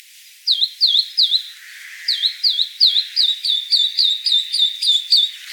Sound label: Mimus polyglottos; ecologia paisaje sonoro